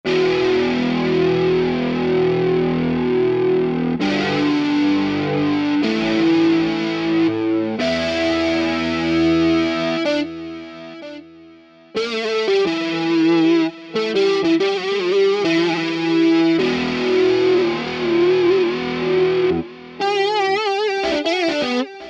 Big Electric Lead, guitars; Apple GarageBand 2

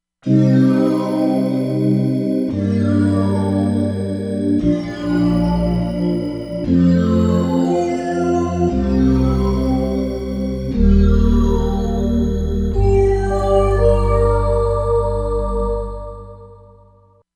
Galaxy, Korg MSC-07S Synth combinations; Korg MSC-07S Synth 2

